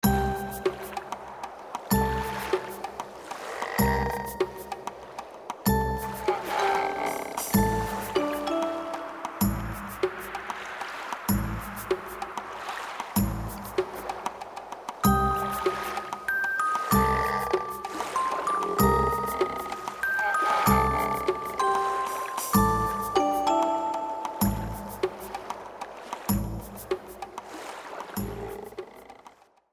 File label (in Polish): muzyka